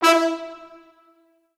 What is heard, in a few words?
Orchestral Brass, E3; Best Service ProSamples vol.20; AKAI